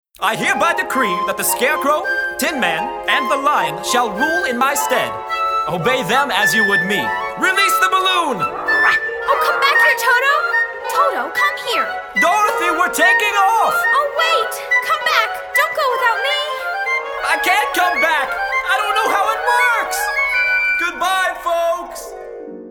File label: Balloon Ascension, VOCAL TRACKS; Wizard of Oz